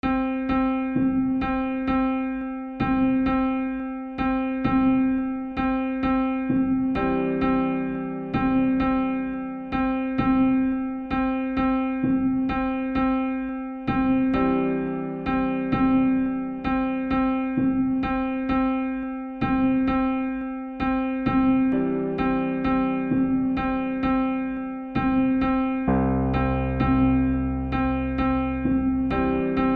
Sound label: Piano Bells